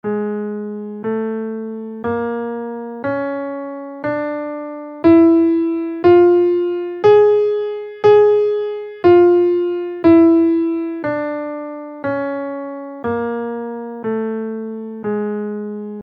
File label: locrian bb3 bb7 scale in Ab, guitar